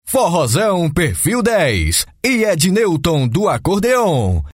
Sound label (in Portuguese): abertura